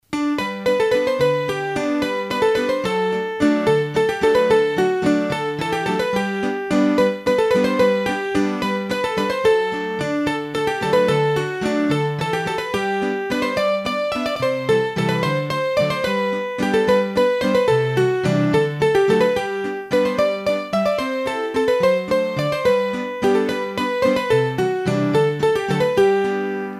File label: Mazurka, hurdy-gurdy; Mazurka de Samatan; Mazurkas